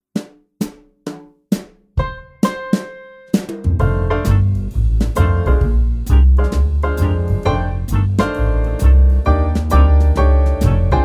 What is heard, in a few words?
improv accompaniment only